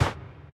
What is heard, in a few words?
mech armor land sand; Factorio